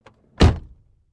Closing Car Door

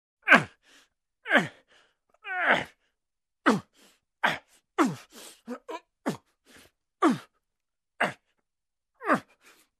Male pushing a heavy object

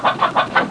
Hen clucking in henhouse

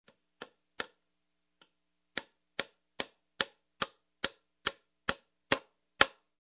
Hammering a sheet of metal

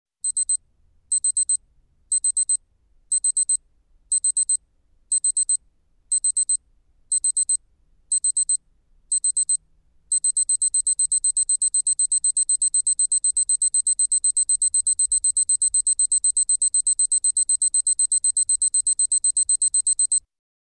Alarm clock digital beep

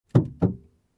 Car door handle open

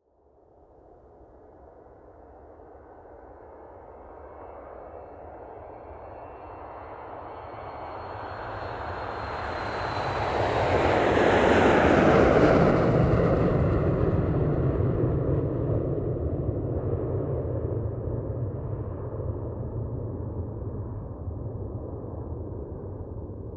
Airliner Distant Lifting Off Whirring Sound

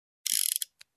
Pulling back revolver hammer

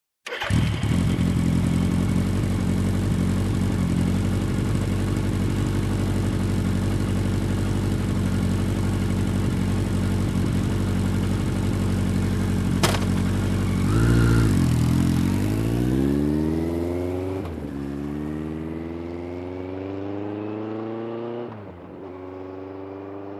Motorcycle engine ignition and drive away

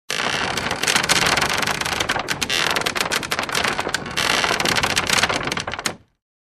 Loud Wooden Squeak

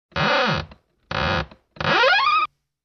Old Wooden shelf door squeak

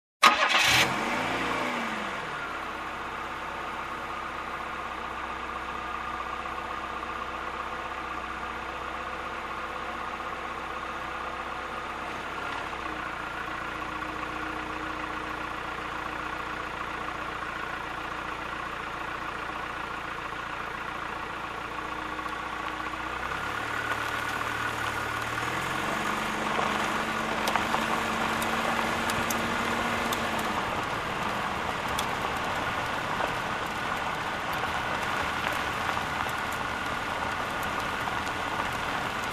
Car driving on dirt road

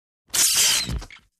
Electric Shock Sound

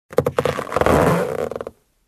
Leather Squeak

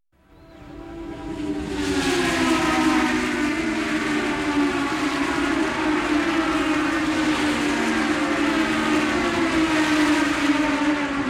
F1 Cars Passing by

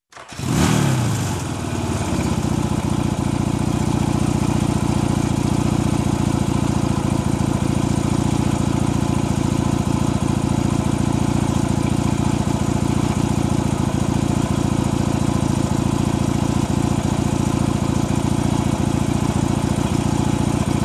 Offroad Motorbike idle engine

